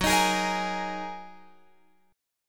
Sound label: F sharp 6th Add 9th F sharp down Guitar Open F; strummed; F#6add9